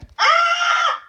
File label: scream